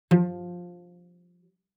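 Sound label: Cello pizz fortissimo sul D F3; MIS Pitches - 2014; Strings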